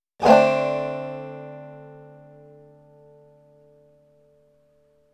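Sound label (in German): anschlag mit gegriffenen saiten, banjo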